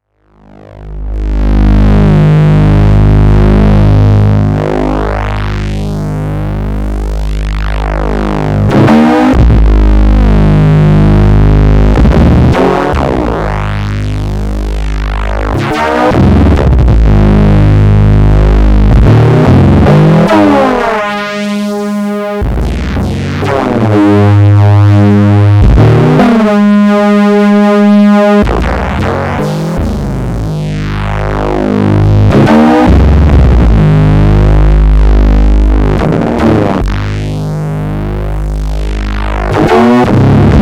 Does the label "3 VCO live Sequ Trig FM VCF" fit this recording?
Yes